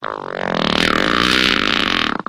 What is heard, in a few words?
fart long; Farts